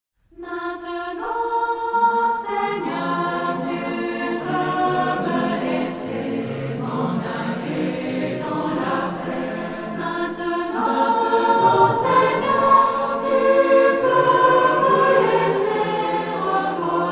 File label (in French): Cantique de Symeon Chant de la communaute; communaute de l'Emmanuel